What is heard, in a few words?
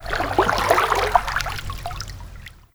Water Lapping Gentle Medium; Ambience; Warframe